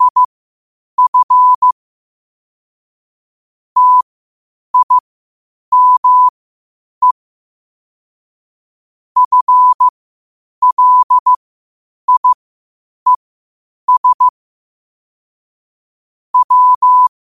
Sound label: 5 wpm, morse